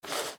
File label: Enlarger Focus In